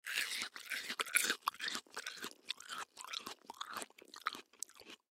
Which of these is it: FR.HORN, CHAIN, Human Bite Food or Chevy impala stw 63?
Human Bite Food